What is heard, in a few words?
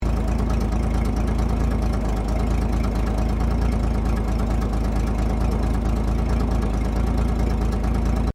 CAR HOLDEN FE IDLE FRONT LOOP, Classic Australian cars; Vehicles; SoundEffects-Foundation1